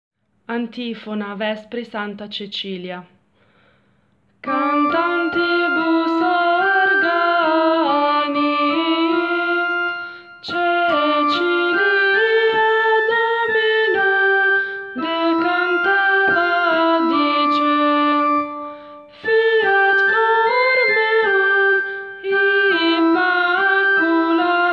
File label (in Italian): Antifona; Santa Cecilia